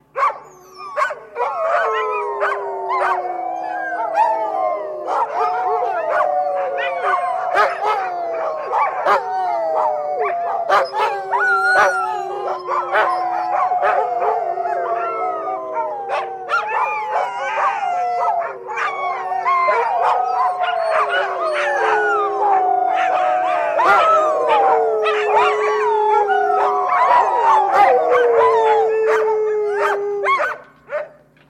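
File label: sounds with dog howling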